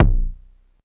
polyvox bassdrum; Formanta Radio Plant

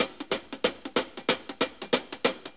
Shuffle HiHats

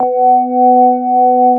CX3 ORG B3, Keyboards Old School; USB Soundscan vol.09 - Keyboards Old School; AKAI

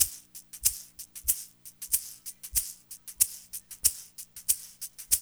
SHAKER, Funky Ass Loops; East West

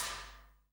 SHAKERS; Best Service; Dance Mega Drums CD2

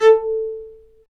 STR, Low Marcato; String Master Series; Combos 1

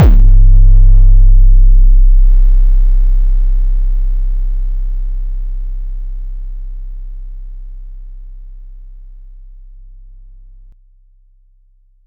boom, Kicks; ModelCycles

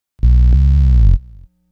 Big Buzz Bass, Hip-Hop; Construction Kit; Sonic Foundry (Sony Creative Software)

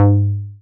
303, TB-303 bass

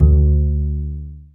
STR, STRINGS; Roland - LA Composer vol. 1

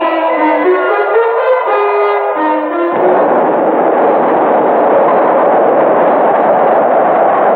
Ancient Music FX, Industrial

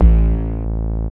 DR. LOOP, BASS; X-Static Goldmine 3

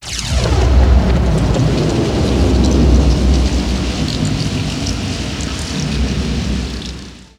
THUNDER; Best Service - XXL Production Effects

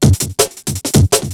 BREAKBEATS, TECHNO

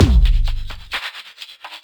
Beat, Drums & Percussion; Electrocution; Beats

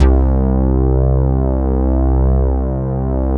SYN RESO, Wavestation; Sound & Vision - Gigapack I CD 2

